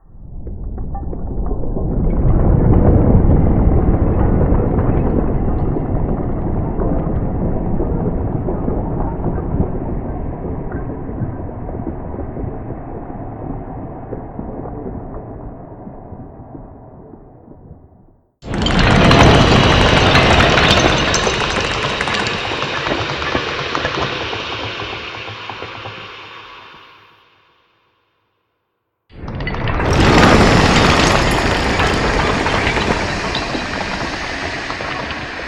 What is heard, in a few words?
Avalanche